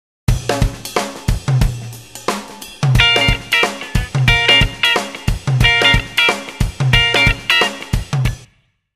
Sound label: Rhythm Guitar